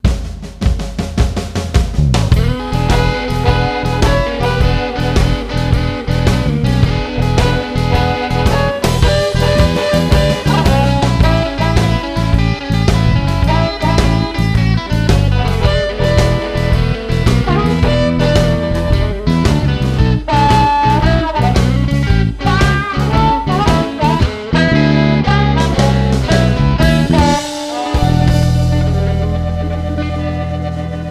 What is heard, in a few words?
Barbeque Blues Long, Jingles